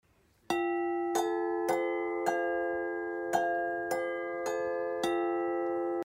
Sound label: Harmony Bells F4 F5; Groen